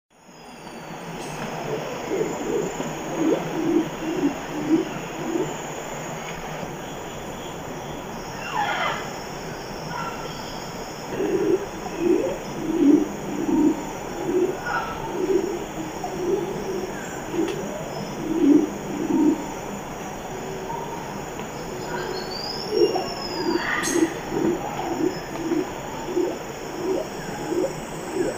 Long-wattled-umbrellabird, پرنده چتر مرغ غبغب دراز